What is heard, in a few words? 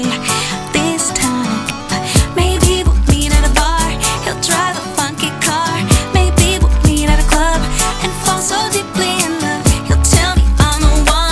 pop